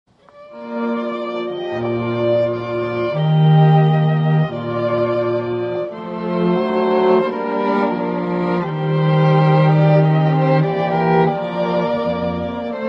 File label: St Anthony Chorale